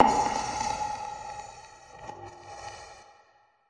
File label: sizzling firework like hit, Electronic Hits